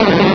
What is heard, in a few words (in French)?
Cri